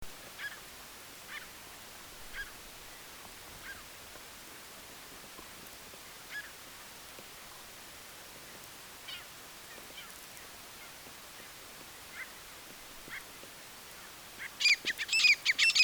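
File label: black francolin voice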